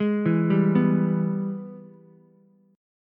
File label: Ab Minor Major 7th Sharp 5th chord, Ukulele; arpeggiated; JGuitar